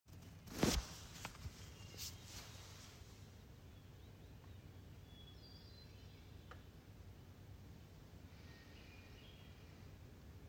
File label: Hermit Thrush Soundscape